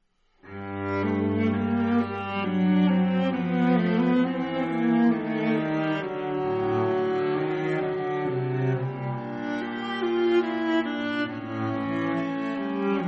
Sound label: Harmonie